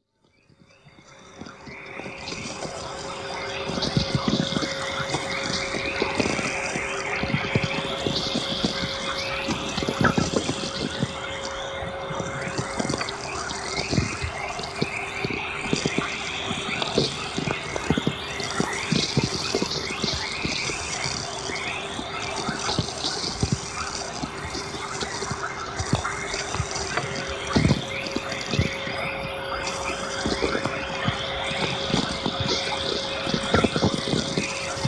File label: Introductory Soundscape